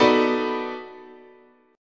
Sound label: B 7th Sharp 9th, guitar chord